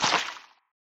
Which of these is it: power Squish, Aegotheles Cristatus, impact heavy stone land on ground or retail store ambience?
power Squish